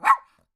Dog Poodle; unsighted

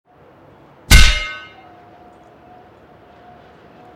Hammer

